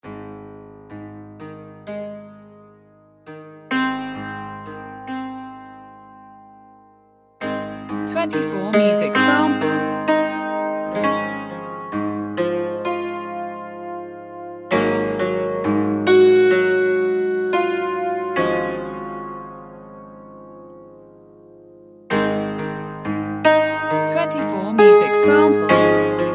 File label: beautiful dulcimer